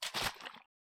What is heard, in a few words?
Water